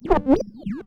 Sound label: Roland C Noise, Roland S100